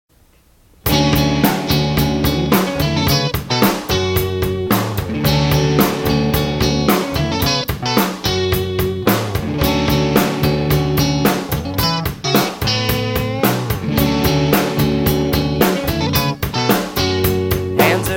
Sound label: pop